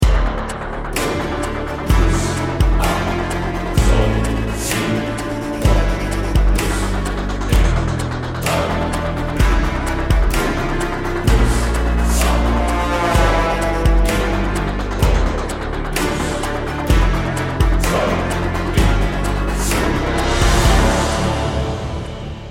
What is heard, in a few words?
start round, music